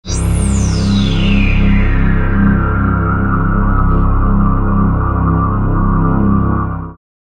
Filtered Bass C additiv

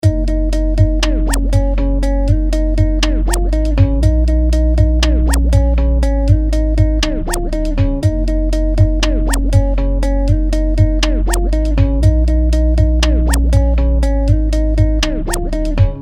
Scratch Fill; ShaperBox 2

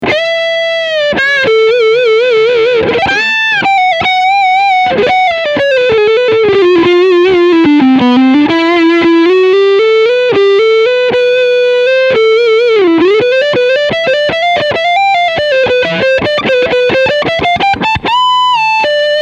Leadsound Front PU

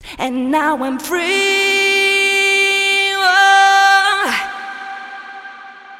Vocal Rev

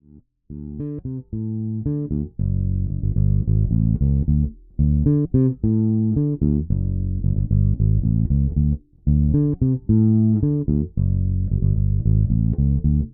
Fender Funk; More James Brown

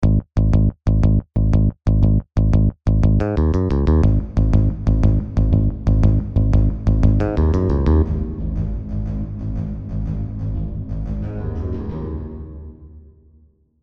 Lexicon Hall Bass

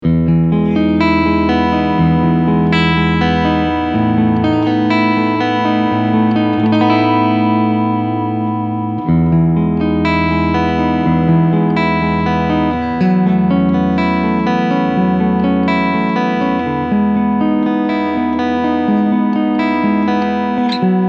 CL EQ 12 Uhr

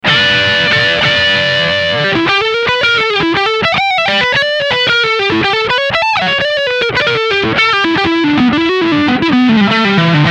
Channel B Medium Gain

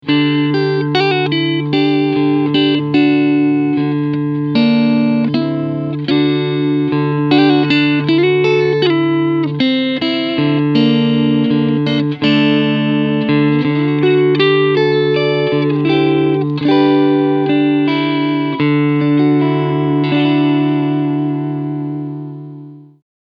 Ch1 Clean

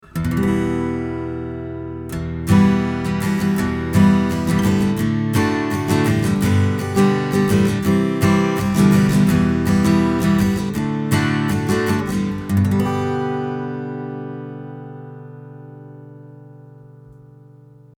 A GUITAR; DPA 4099

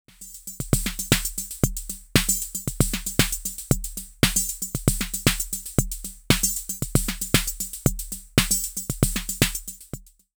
6equencer Loop

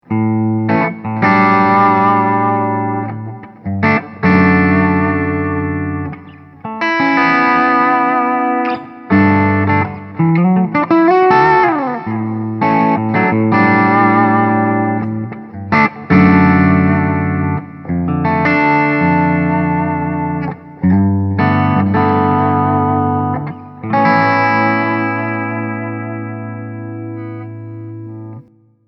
Pedalboard out Overdrive

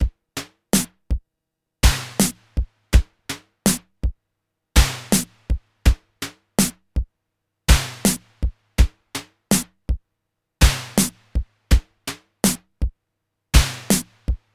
Simmons SDS 1000 Halftime

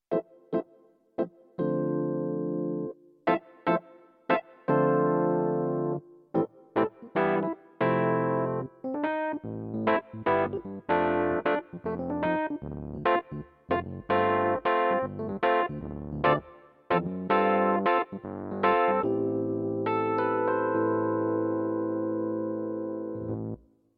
EPiano

